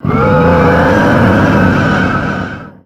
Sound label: summ tentacle